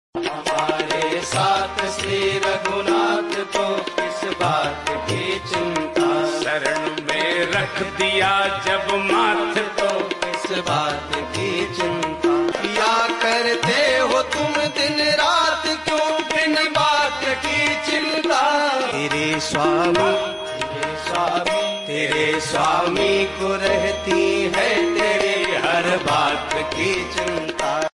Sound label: Bhajan Ringtone